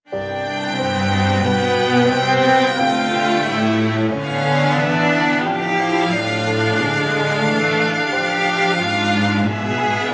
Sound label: waltz; Grobda Remix